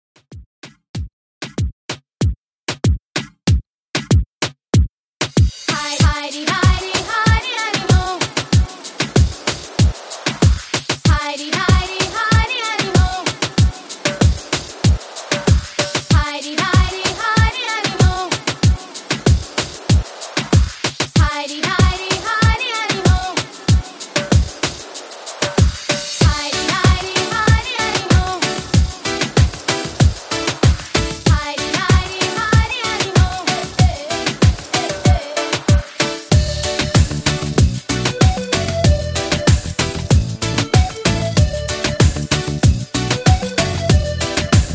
90s Redrum 95 Bpm

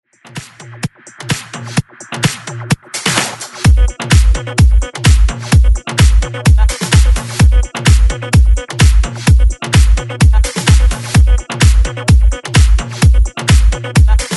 128Bpm BREAKMIX; DJDOLLS

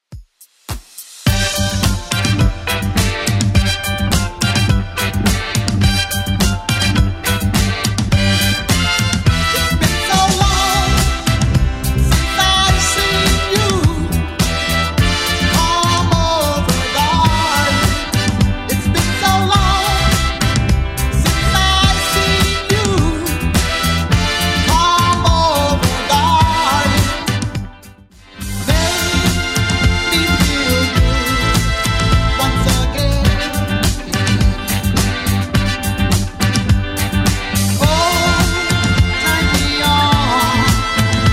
70s Redrum 105bpm

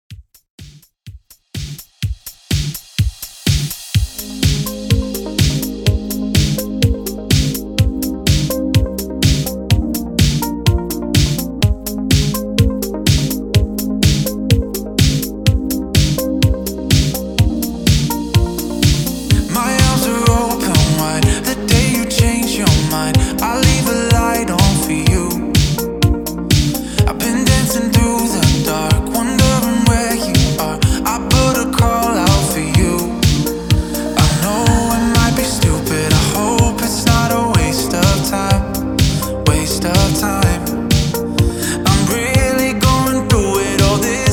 REDRUM 125 BPM

